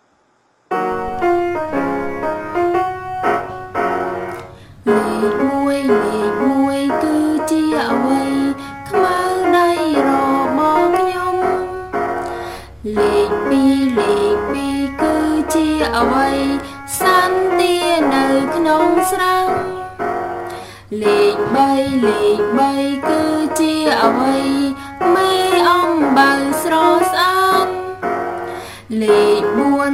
Number Song; បទចំរៀង-លេខ

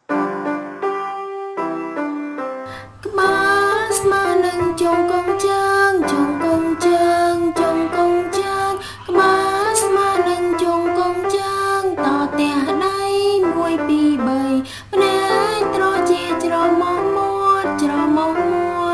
Body Song; បទចំរៀង-រាងកាយ

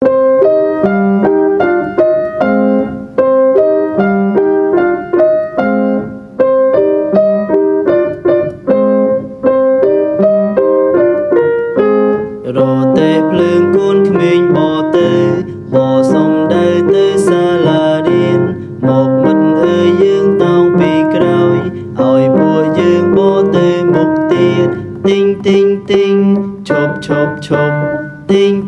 Train Song; រថភ្លើង

